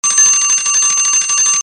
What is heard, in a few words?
bell; timers